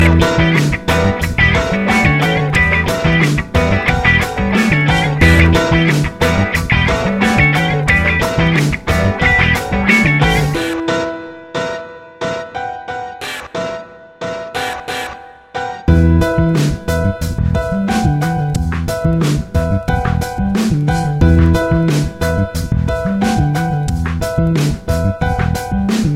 Wonderful Loop

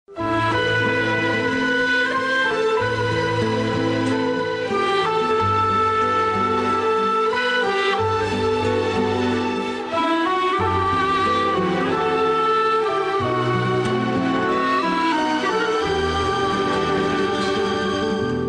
Turkish

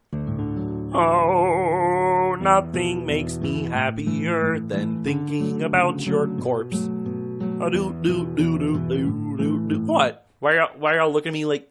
SINGS; KERMIT

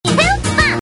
Hoof-bump

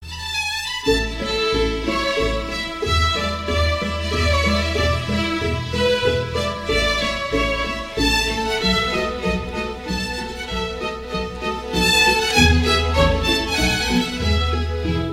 String

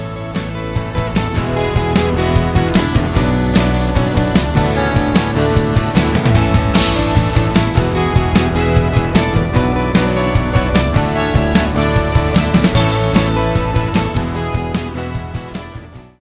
Acoustic

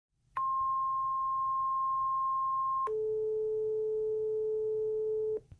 Fire Tone; CityFD

